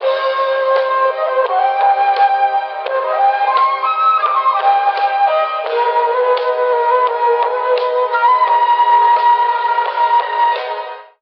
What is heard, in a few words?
flute darkness falls; Loops; Electronic